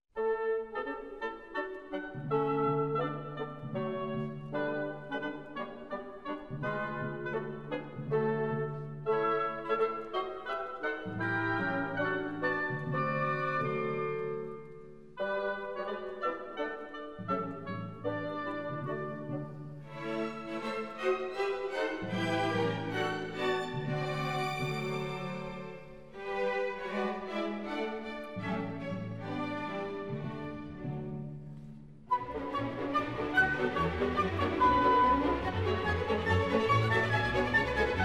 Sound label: D-Dur in D Major Andantino grazioso; Ungarisches Festival